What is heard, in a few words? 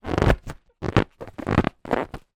lilo rubber ring squeaks movements